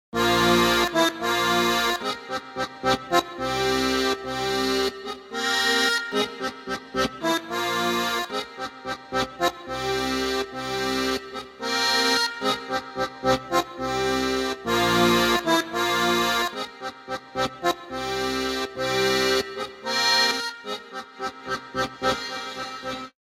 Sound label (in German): 10 Takte Tango